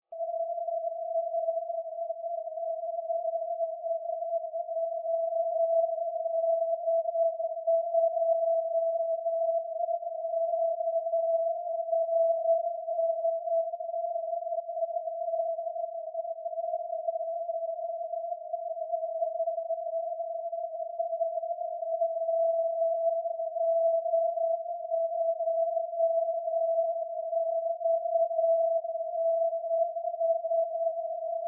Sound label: cw